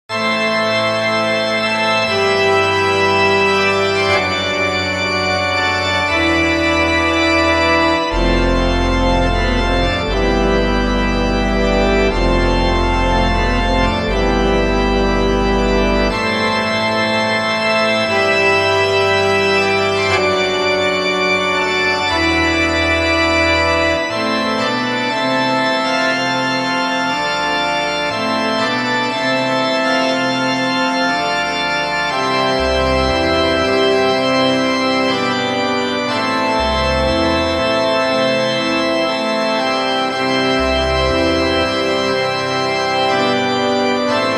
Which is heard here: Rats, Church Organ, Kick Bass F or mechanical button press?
Church Organ